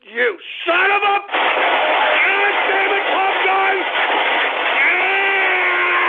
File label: cans